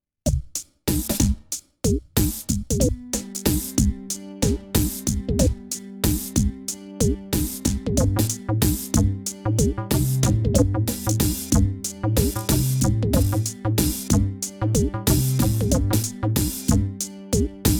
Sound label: Synth 93 bpm